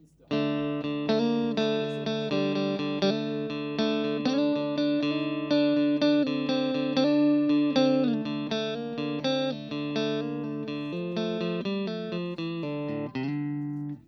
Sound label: stratocaster; fender new standard guitars